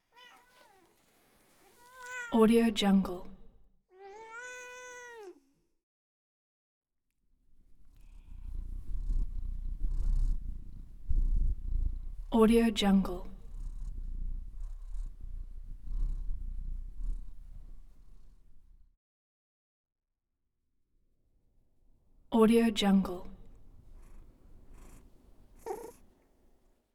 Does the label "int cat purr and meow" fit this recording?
Yes